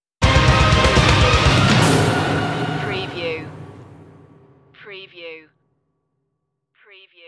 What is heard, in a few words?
beyond hell stinger